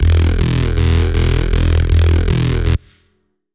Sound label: Reese one shot E 175